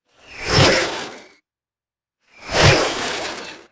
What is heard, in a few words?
fast fluttering projectile whoosh and impact